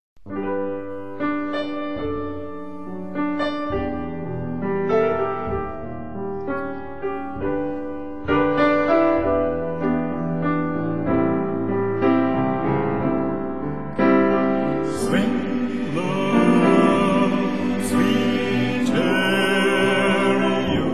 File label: Swing low sweet chariot